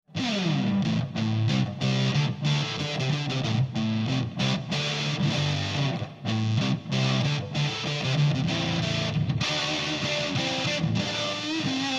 Lizard Fuzz; ScreamingLizard

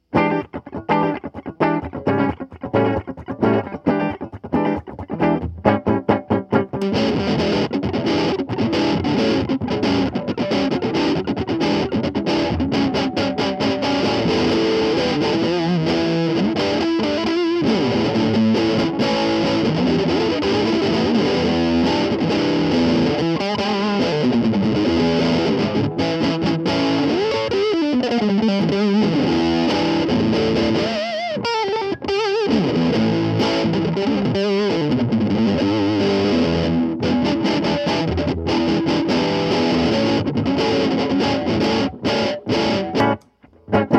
Bapbapbapbap Low Gain

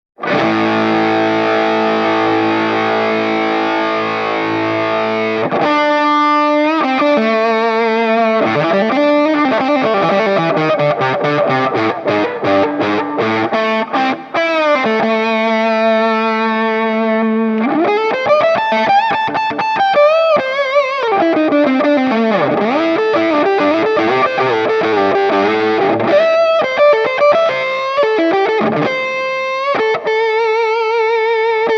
cosmos gain 3o clock

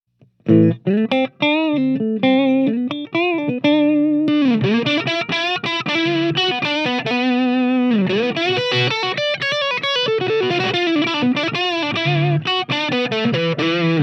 Single String Sample, ScrewDriver

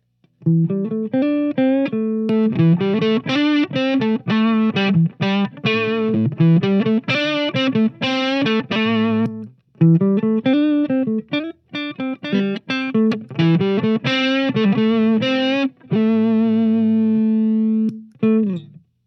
Mellow Comparison

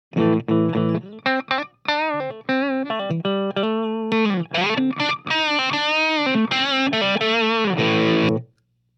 Quick Check, ScrewDriver pedal

